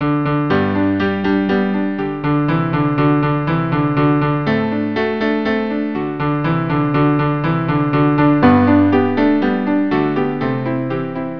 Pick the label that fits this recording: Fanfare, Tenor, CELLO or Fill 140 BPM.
CELLO